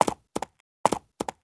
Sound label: Pegasus Footstep